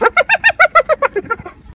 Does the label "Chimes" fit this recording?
No